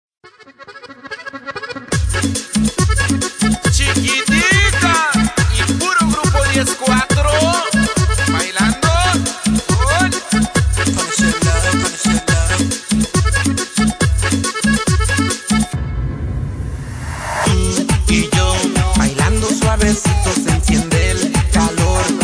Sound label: tribal